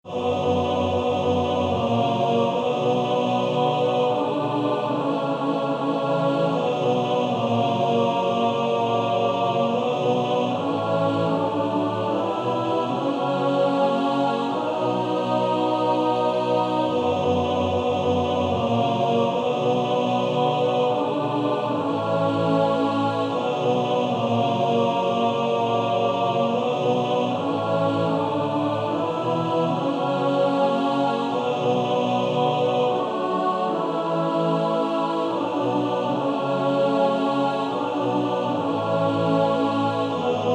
Male Choir TTBB; Coventry Carol

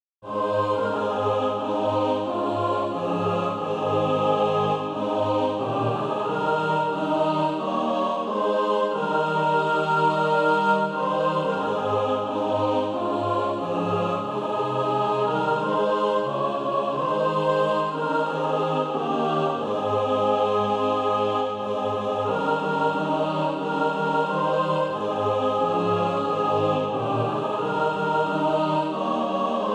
mixed

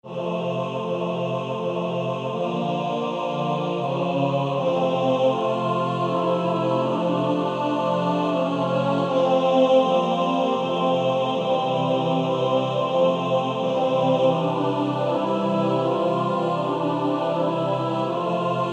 Male Choir TTBB

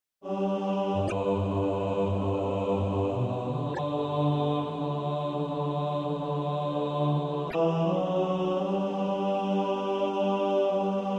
bas

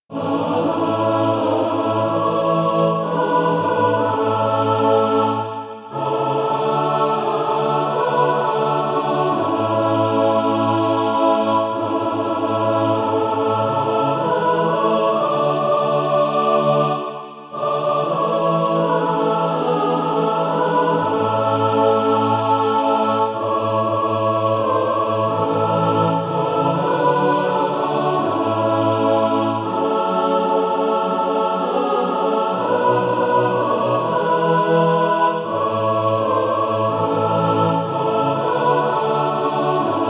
mixed; SATB

